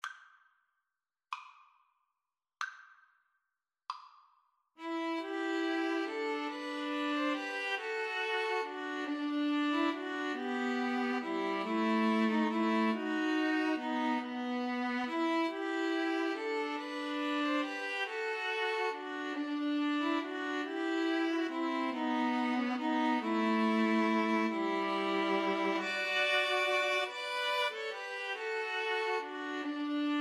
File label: what child, string trio